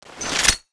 revive gun draw, weapons

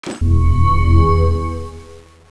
summoning

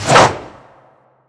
spear throw, weapons

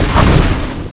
attack special; CSO Cronowing; cronobotics54

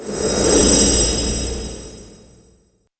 divine titan charge, sound/weapons

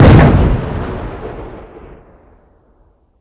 chain mg, sound/weapons

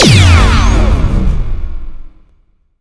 alteration ammo gun, weapons

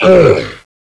pain, zombie; zm mod

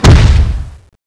footstep, giant titan; zombie

